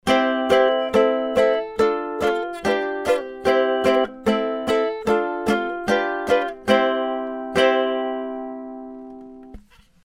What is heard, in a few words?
Rode Broadcaster Ukulele